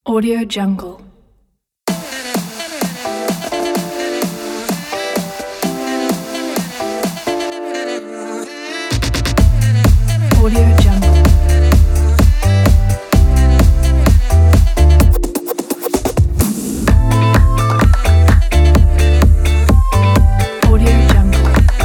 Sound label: pop artwork